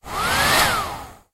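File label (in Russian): короткий звук фена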